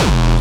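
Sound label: hard bass drums; Bazzdrumz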